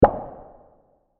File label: Sci-Fi Blobb Impact